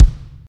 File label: thumpy kick sample c sharp key